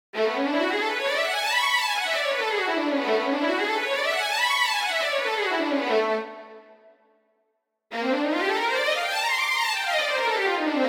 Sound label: fast violins; strings runs